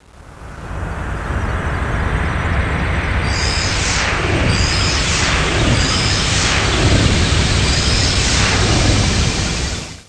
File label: SNOW STRM